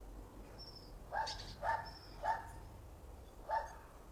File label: loudest dog